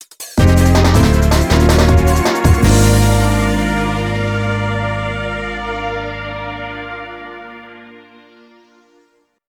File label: Jingle; Gran Turismo 4